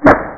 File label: shoot